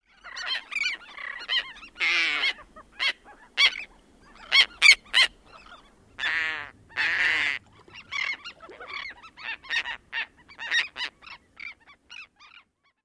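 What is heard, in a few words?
ROYTER calls near nest, royal tern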